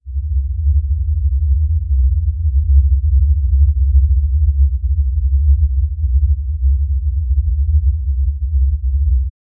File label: a profoundly slow deep an; subterranean